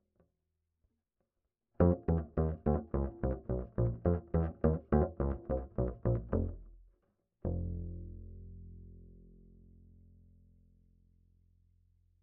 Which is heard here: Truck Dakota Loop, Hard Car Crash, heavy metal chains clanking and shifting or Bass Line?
Bass Line